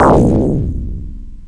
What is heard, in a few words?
explosion; Sex24 Breakout